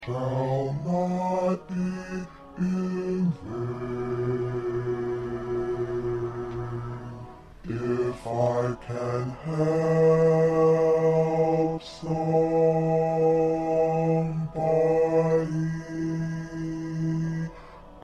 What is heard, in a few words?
Bass